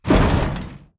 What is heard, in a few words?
bullet explosive titan shudder; Titanfall 2